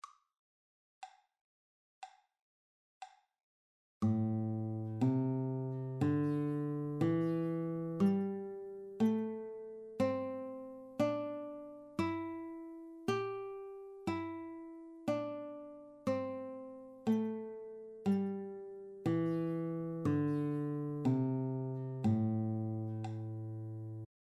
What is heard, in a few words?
A Pentatonic open, guitar